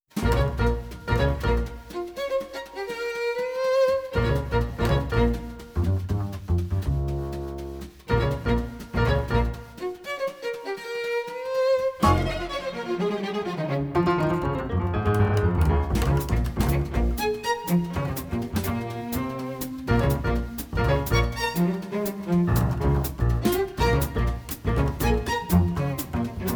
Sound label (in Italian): piano corde